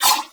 Nunchaku Prime Whoosh; Warframe; Tenno

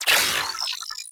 Advert Hologram On; Ambience; Props; Corpus Ship

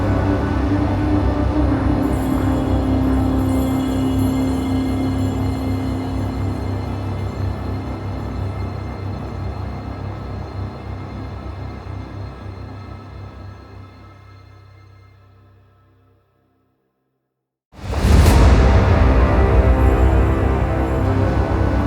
Back Room Reveal End Stinger; Entrati; TennoCon 2023

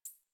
Computer Room Beep; Warframe; Ambience; Nineteen Ninety Nine

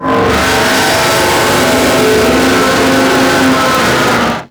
Sentient Vessel Movement Limb, Enemies; Warframe; Tau

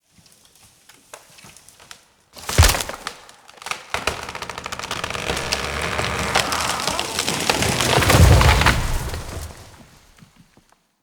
Broken Tree Fall; Cinematics; Bromius; Bromius Quest Broken Tree

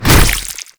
Tentacle Swing Hit; Enemies; Abilities; Warframe; Proto Infested Ancient

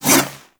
Kunai Whoosh; Warframe; Weapons; Stalker Kunai

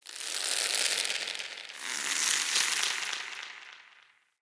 vine creak; Ambience; Under City

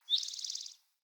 Bird Song; Pothia Bird Guide

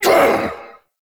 Pain Grunt; Stalker; Warframe; Jade Shadows

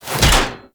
Punch; Orb Nose Finisher; Warframe; Venus; Orb Heist; Cinematics

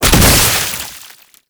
Tripwire Explosion; Enemies; Gameplay; Boss; Warframe; Oraxia

